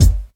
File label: kick full west coast